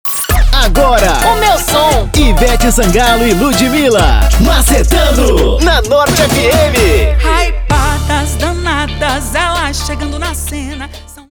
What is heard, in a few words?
intro music power